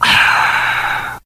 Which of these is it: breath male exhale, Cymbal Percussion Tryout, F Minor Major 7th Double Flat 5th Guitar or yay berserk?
breath male exhale